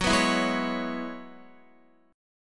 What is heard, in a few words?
Gb 7th Suspended 2nd Suspended 4th, Guitar; strummed; JGuitar